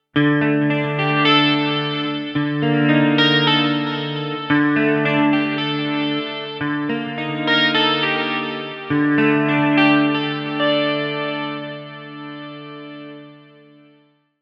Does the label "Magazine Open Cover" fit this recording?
No